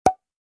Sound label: Pop cute sound; ready set go sprout macos windows gamerip 2024